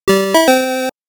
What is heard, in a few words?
Victory (SMS Version)